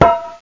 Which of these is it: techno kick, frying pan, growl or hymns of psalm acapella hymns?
frying pan